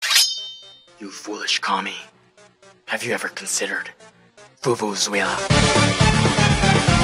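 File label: vuvuzela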